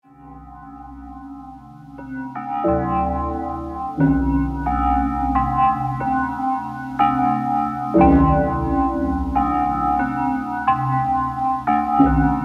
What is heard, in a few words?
inharmonic sound bell